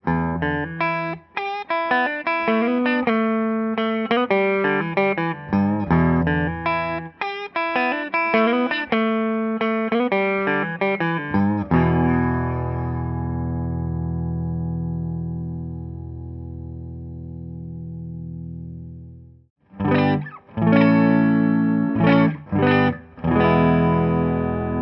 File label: neck clean